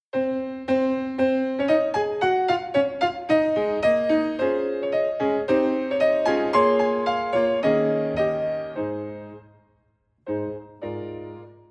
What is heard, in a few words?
Accompaniment Eb; instrumental solos